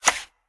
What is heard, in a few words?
Ticket Appears; angry birds